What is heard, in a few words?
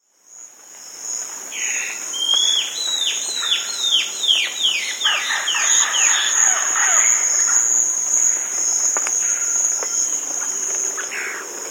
Chestnut-Crested Antbird; S. America